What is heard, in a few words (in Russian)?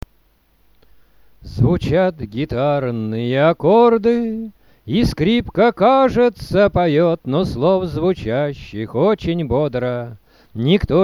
zvuchat gitarnie